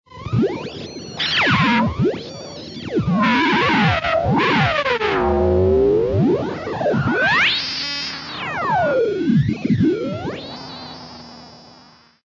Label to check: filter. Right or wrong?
Right